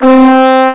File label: Trumpet; HyperComposer™ DEMO